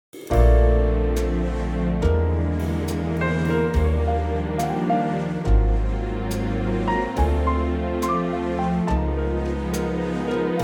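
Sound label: Bb orchestra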